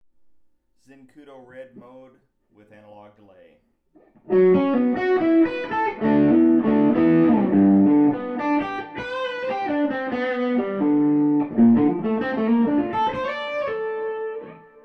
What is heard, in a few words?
Red mode with analog delay; Zenkudo